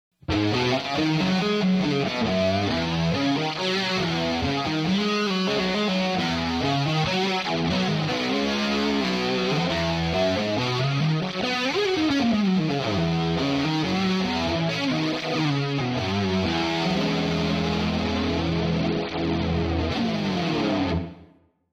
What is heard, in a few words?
Digital Sine; tonecore